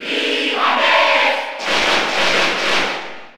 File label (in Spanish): Cheer Spanish PAL; Ness; SSB4